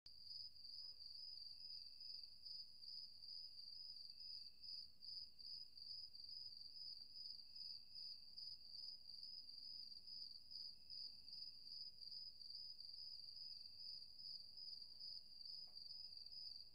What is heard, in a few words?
Crickets Night Time Sound; Phasmophobia